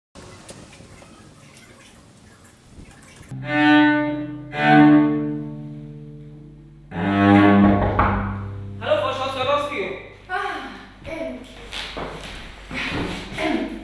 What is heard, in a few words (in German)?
Cello Akademie; extrahertz